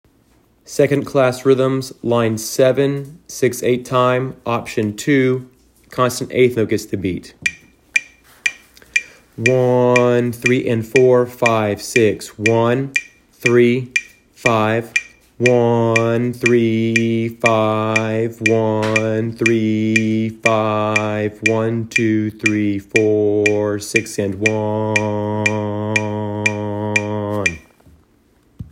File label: rhythms eighth note